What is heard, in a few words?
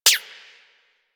Rifle Mech; Warframe; Weapons; Wisp Rifle